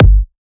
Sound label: Kick, Psytrance; One Shots